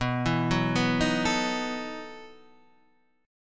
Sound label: E Major 7th Suspended 2nd Suspended 4th